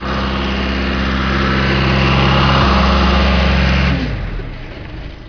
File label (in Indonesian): suara mobil